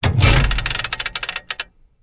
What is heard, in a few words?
control stick, ships